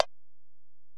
Ac Perc, Drum Machines Alesis DM-PRO

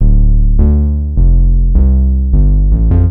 Bass, Futurist Drum & Bass

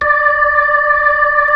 B-3, Keyboards of The 60's and 70's; Club Xfade

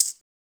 RSS PERC

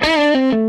Les Guitar Loop; Sonic Foundry (Sony Creative Software); Six-String Orchestra

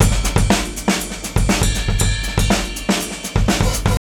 ALL RIDE 120, OFFMIKE DRUMS

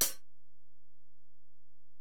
HiHat, Alesis DM-PRO

